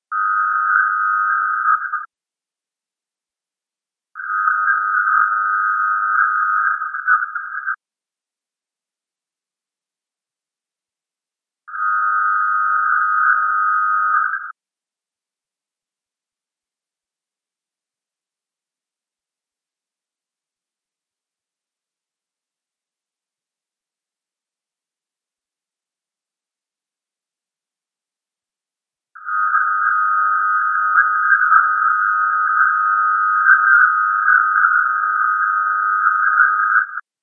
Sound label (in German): signale